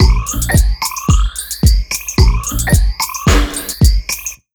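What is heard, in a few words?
110 beat, Ragga & Dub Reggae; USB Soundscan vol.27; AKAI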